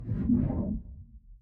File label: whoosh enter, ui